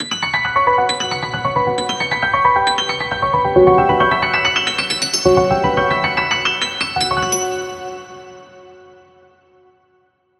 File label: melody